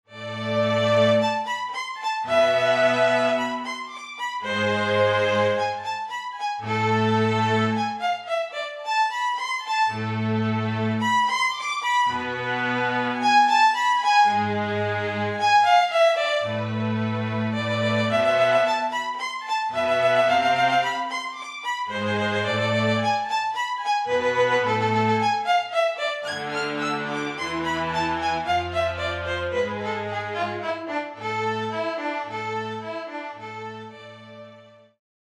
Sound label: Symphony in Raga Hemavathy